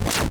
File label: katana slash lowbit